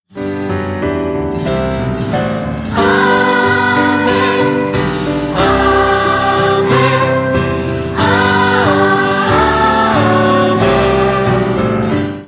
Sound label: The Great Amen Vocal